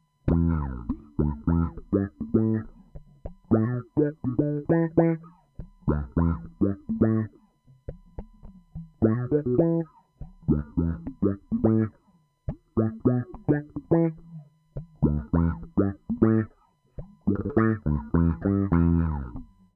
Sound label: wah funky